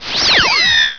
ready, weapons

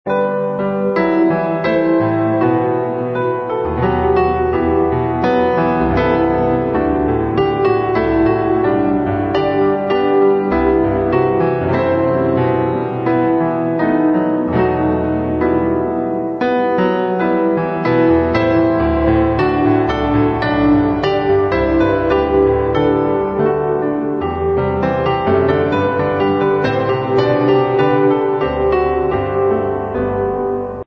start vote

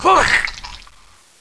Pain Female

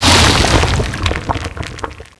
bomb exp; cstrike; impulse

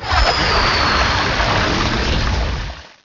aqua swirl cast; zombie plague

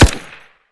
gun

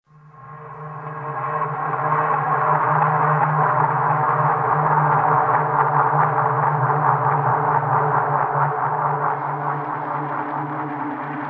fade ambience; zombie plague

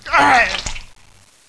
zm pain; cstrike

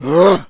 zombie attack, claw attack; zombie plague

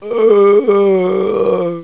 Zombie Idle; cstrike

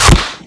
impact

